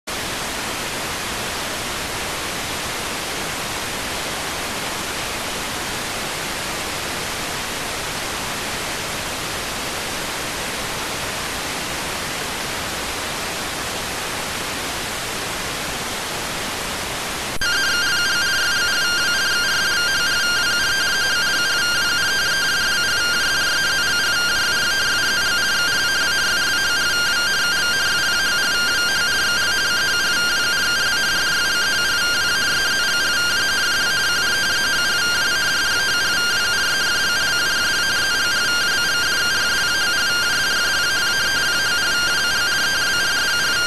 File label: rtty rx